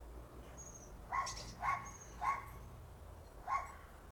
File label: loudest dog